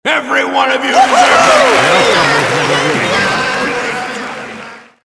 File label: cheer applause